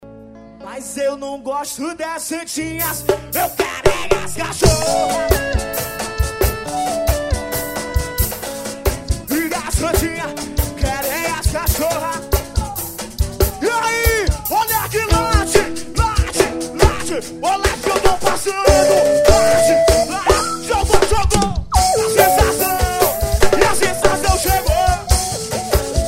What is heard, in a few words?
late swing play